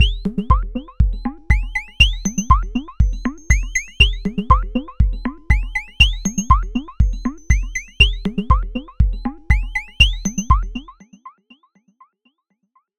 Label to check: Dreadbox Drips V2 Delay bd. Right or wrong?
Right